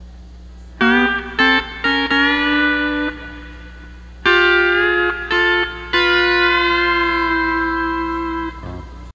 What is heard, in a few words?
12 String Lap